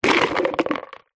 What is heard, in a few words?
cones plastic several knock over concrete; zapsplat